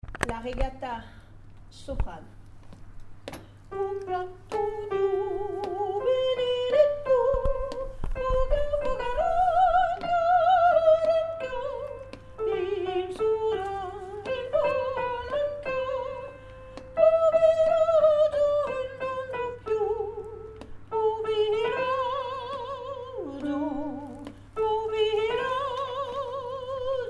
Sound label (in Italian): regata sop; Regata Veneziana - G.Rossini